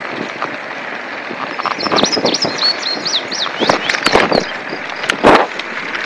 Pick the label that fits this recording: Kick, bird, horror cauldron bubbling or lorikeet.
bird